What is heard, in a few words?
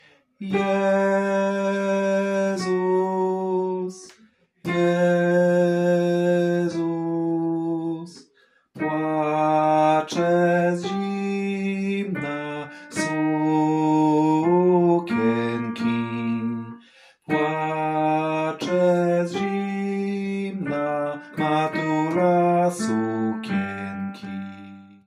bass; Jezus Malusienki; nagrania glosow warsztaty2026